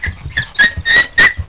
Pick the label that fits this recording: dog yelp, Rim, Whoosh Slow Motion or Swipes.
dog yelp